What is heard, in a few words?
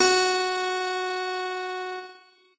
piano